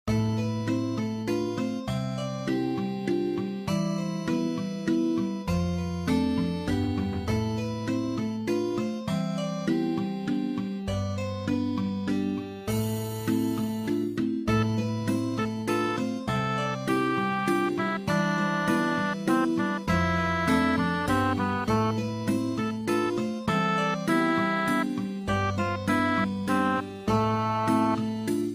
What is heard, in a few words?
Harmony Classic